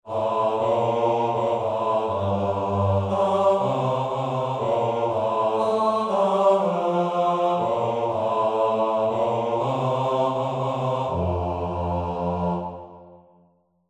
Bass

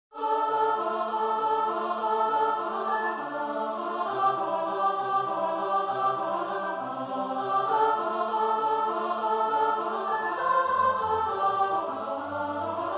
Tutti Finale Alto

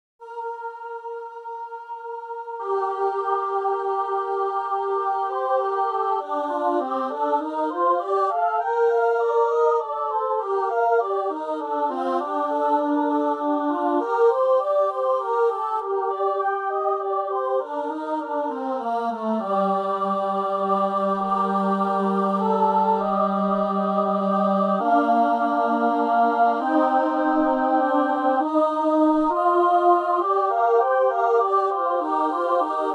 Good King Wenceslas Minor All

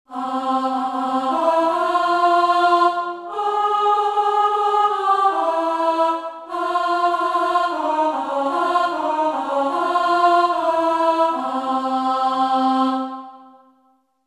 alto

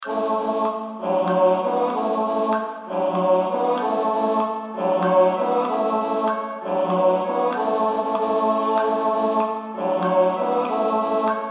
Bass sans insts

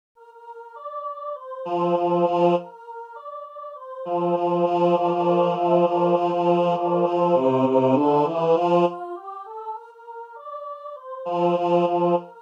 Kisa pada Men

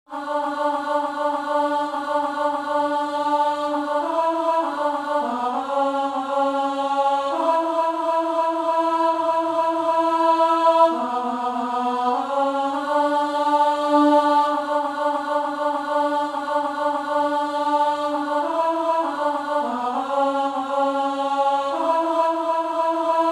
Alto

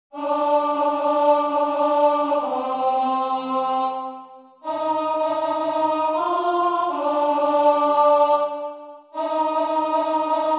Tenor

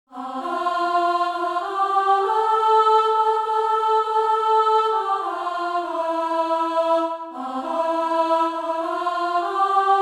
Venid Pastorcitos alto

